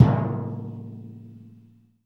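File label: BIG TIN, percussion metallic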